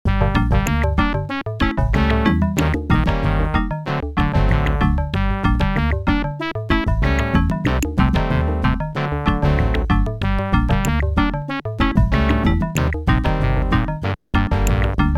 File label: OPL2; LeChuck; The Secret of Monkey Island